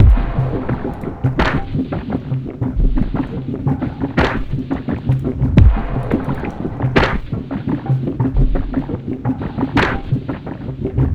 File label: 86bpm confusion; M8; percussion and foley